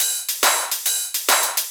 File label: Shuffled Loops, 140 BPM